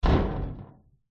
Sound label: impacts metal panel large hit loose rattle; zapsplat